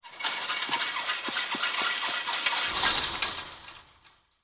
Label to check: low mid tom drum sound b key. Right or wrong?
Wrong